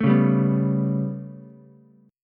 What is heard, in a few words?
B 7th Suspended 2nd Sharp 5th down Ukulele, chord; B7sus2#5 strummed; JGuitar